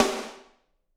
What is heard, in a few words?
SD, Drums; Bob Clearmountain Drums I